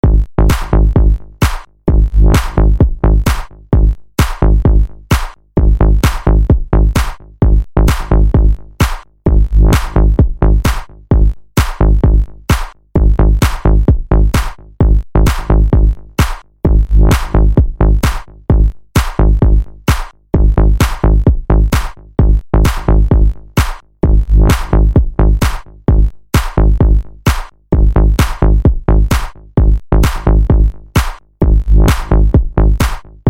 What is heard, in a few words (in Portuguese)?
base mega funk house bass; pack bases mega funk 2020